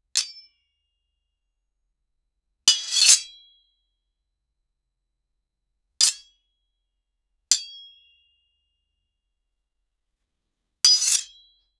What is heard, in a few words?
sword slice metal